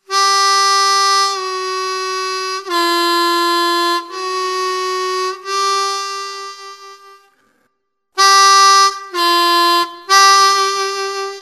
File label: bend, harmonica